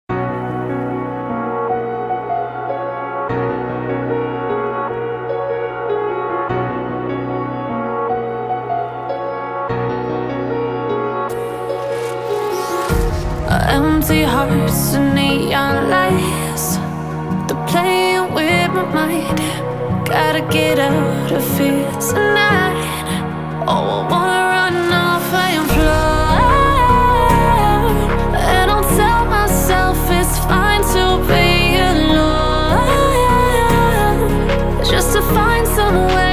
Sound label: melodic dubstep